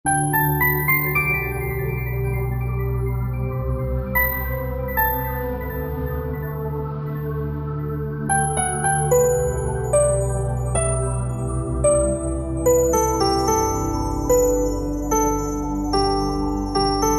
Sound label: Lullaby